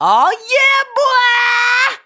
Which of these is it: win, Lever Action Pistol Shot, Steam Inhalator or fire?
win